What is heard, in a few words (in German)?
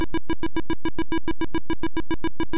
punkte power aneinander